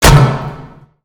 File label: Robot Get Hit Dry; creatures mechanical; Lego